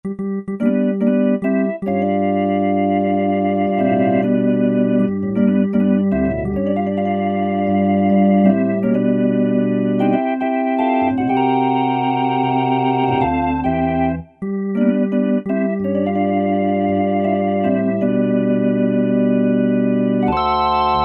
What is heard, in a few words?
Gospel, Alesis Z3; Sanctuary